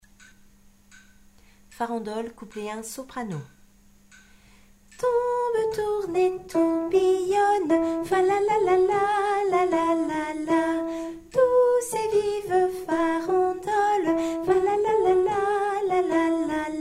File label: farandole soprano